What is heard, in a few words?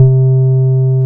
BAS MKS-50, Roland